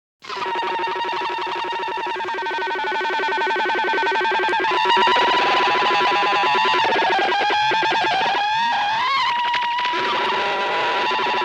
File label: Garbled Radio Signal; Animated Series; Star Trek Original T. V. Series Sound Effects